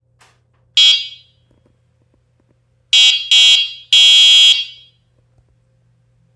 Horn; Hiboy P6

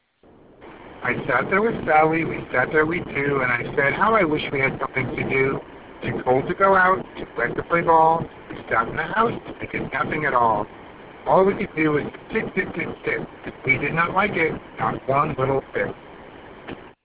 highway car window down; jawbone

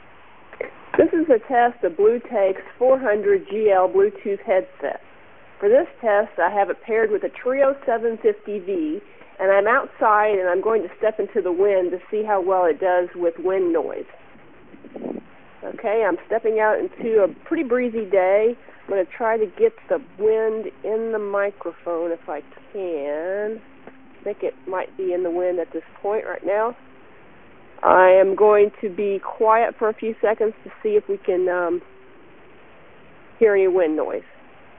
outside; bluetake bt400gl

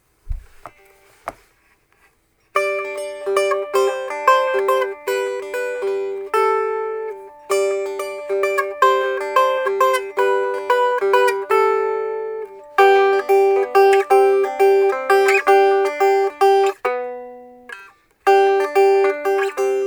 strumstick doodle

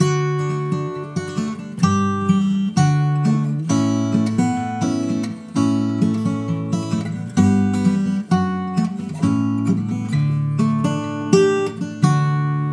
iRig acoustic

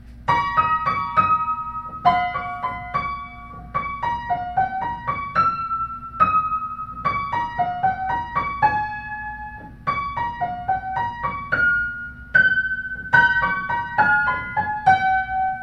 Piano

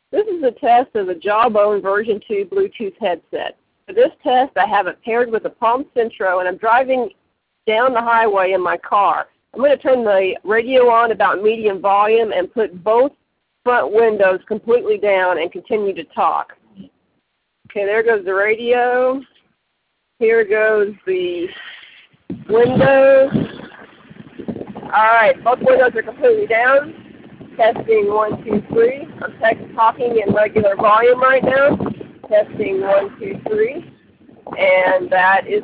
wind; jawbone2

